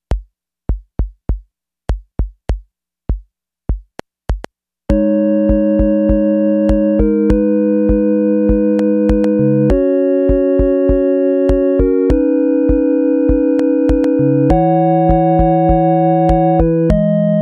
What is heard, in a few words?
disco dance